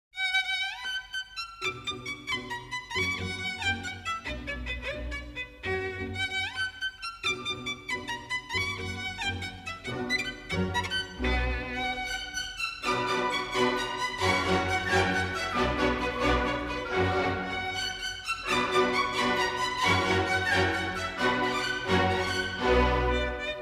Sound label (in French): Concerto pour violon et orchestre n2 - III. Rondo; Paganini; La campanella; Menuhin, Yehudi; Le violon du siècle